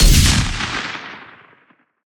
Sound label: Sci-Fi Weapons-Sniper Rifle Single Shot